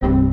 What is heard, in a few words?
error